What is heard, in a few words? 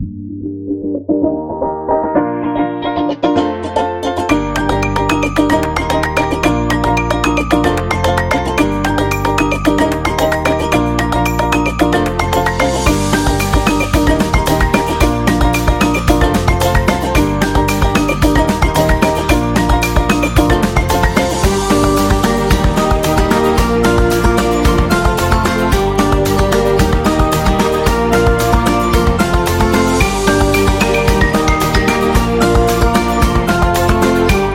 Food Promo; cooking show